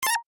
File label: UI Rollover Minor, User interface feedback sounds; Computers; Electronics